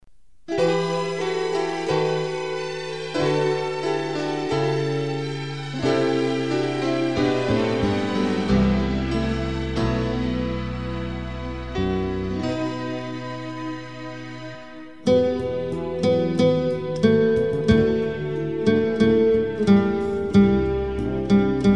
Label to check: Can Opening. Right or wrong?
Wrong